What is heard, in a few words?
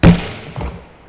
gunshot